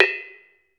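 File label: BONANG, Metalophones, Pitched Percussion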